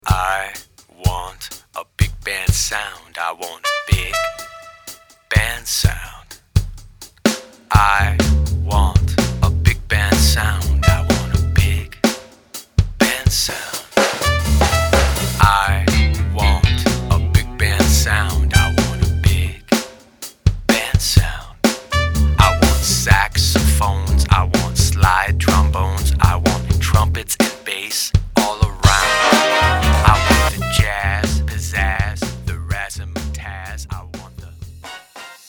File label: Big Band Sound